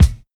prominent kickdrum d key, bass drum sample tuned to the D note